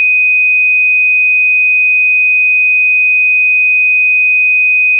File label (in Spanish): tono